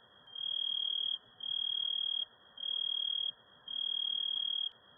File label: cricket